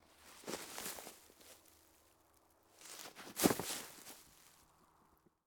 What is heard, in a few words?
Grass Falls